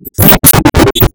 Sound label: cry